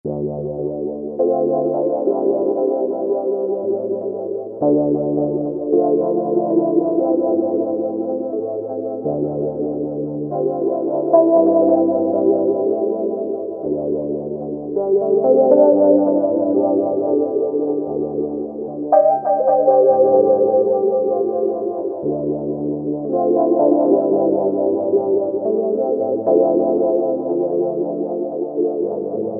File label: 70s Ballad, Roland SRX-07 Ultimate Keys